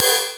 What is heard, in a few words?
Ride, Cymbals; Vengeance Samples